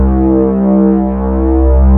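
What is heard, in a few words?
JV square lead, JV-1080; Syntec; Wall of Sounds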